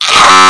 funni scream

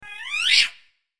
funny cartoon cry

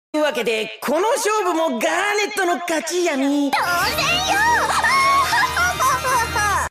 ohohohoho

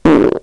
fast fart sound

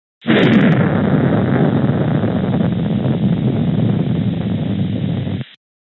explosion

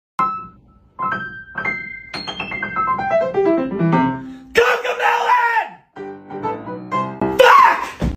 scream

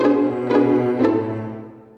game over; pou

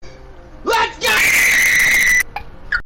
screams

